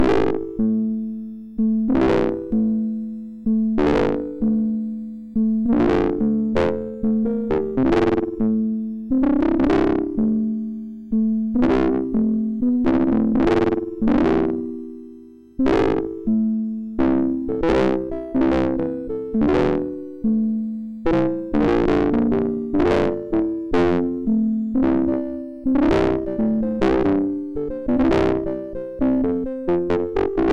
Broken Piano Roll, 6581; MOS6581R4

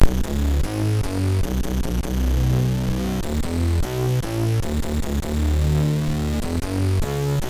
Test, MOS6581; hvsc

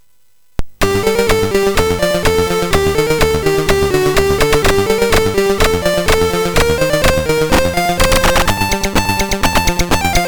Mixes sid MOS6581R4

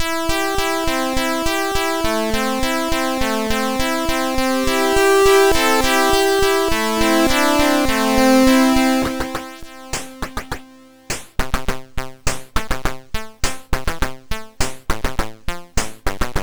Super Synth Sample, sid MOS6581R4

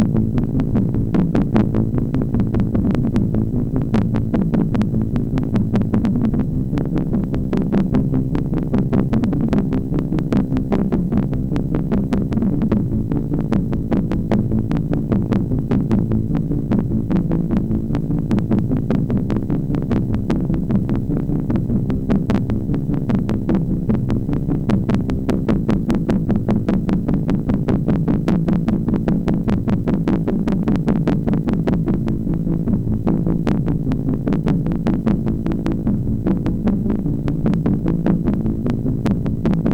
Machine, MOS6581; hvsc

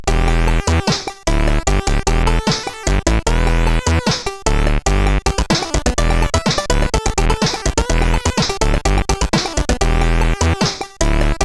Test, CSG8580R5

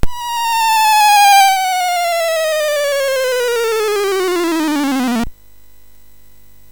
sid MOS6581R3; Through the Trap Door

